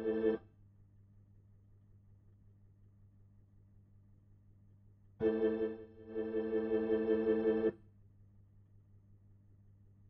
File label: Hammond